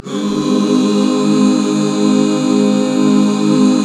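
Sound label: Db major, back chords; Sound & Vision - Gigapack II CD 1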